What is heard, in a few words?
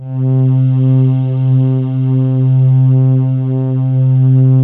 JV-1080 PEACEFUL; VINTAGE-EXP